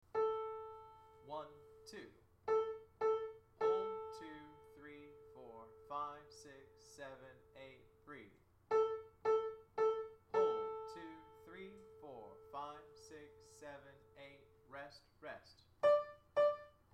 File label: Hall Sop, hallelujah chorus